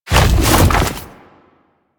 Infested Splitter Jump Launch; Warframe; Grey Strain

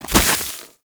Ice Proj Fire C; Warframe; Corpus; Arachnoid Camper Terra; Abilities

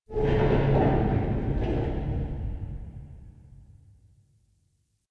Stalker Cave Metal Tension; Ambience; Warframe

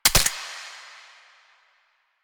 Pistol Mech; Warframe; Tenno; Lasrian

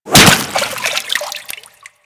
Infested Whip Impact Water

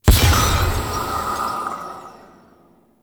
Polluter Eye Hit; Glades Of Andurin

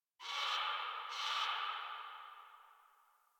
Tree Distant Critters; Warframe; Duviri

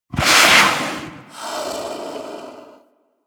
Snow Critter Vocal Breathe; Warframe; Hunting Critters

